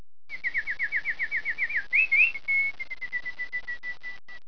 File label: Game Bird; FishingBass